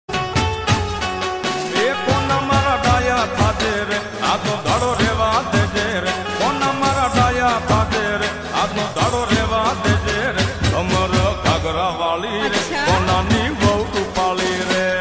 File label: Garba Ringtone